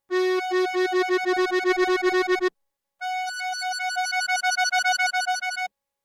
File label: oktavtrill